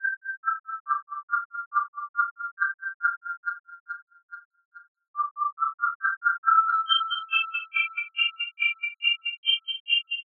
lead

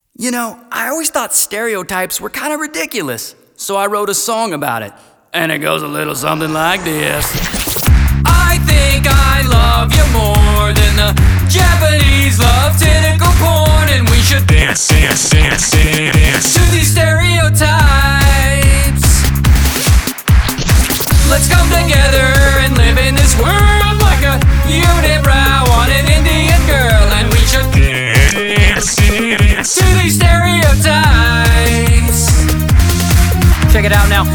stereo motif

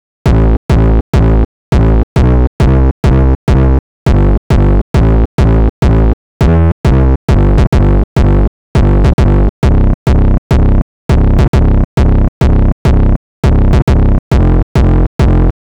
bass

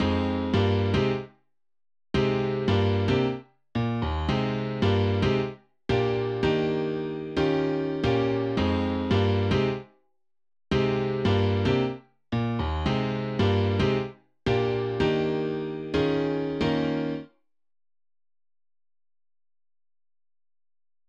Piano A

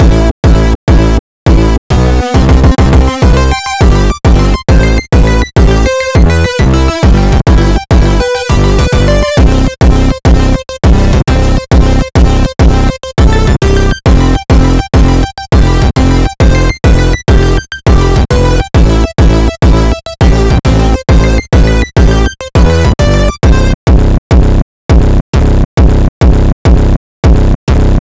beat

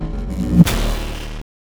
Charge Item

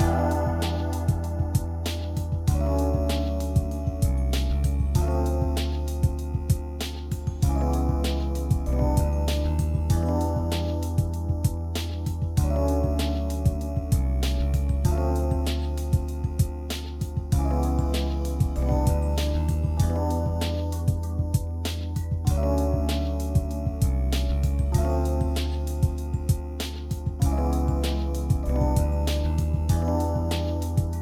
bgm remix paused; rusty remix

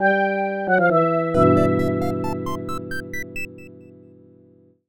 gameover

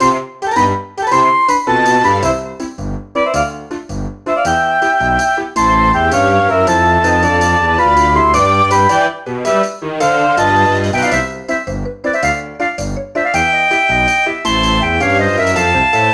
restored piano and perc notes

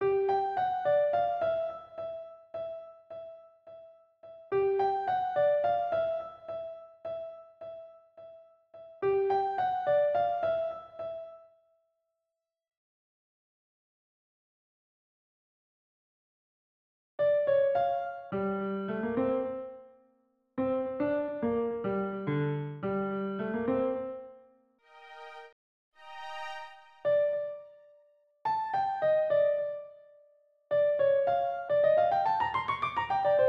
lead

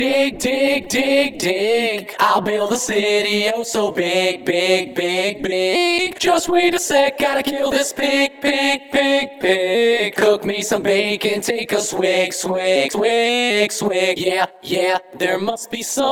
Vocals

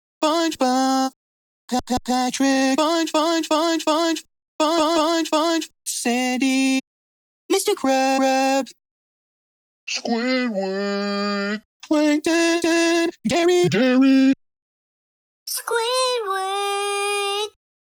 Mixer

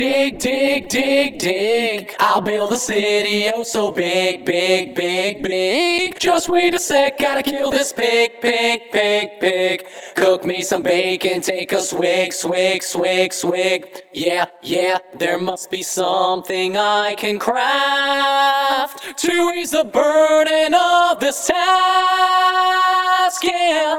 Vocals

